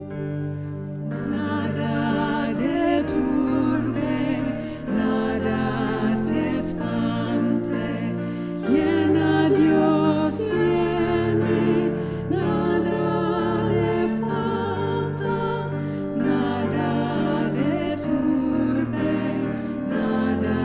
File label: Choir; International Choir; Nada te Turbe; hoofddorp